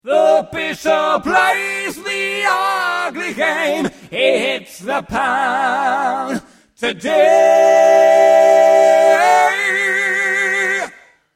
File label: High and Pedal